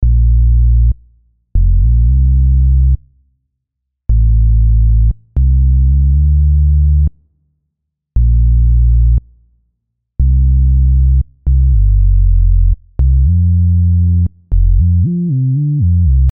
RnB bass solo

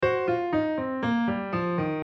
double min pen

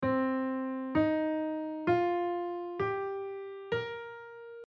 C minor penta

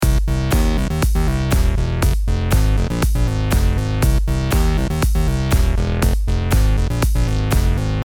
Synth Bass dance

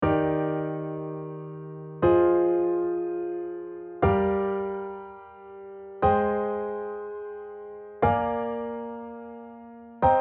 Eb Diatonic Chord

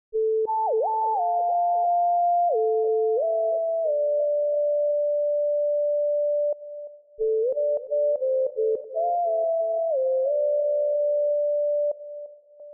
RnB Lead solo

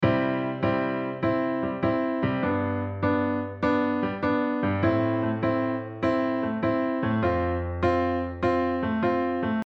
shuffle

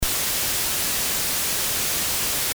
Noise

